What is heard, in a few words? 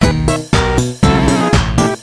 failure; tf; microtf2; jimmyt